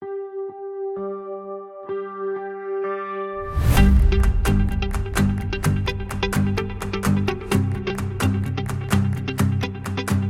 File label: forest